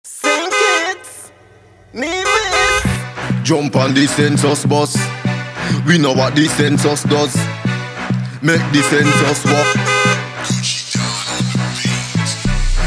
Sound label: Census Bus Jingle; Nevis